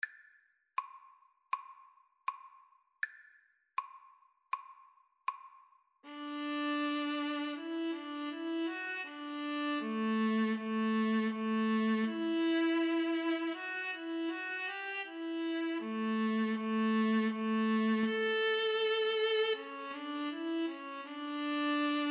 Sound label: Viola Duet